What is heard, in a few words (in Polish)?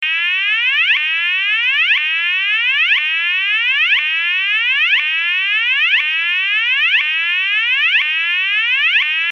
ton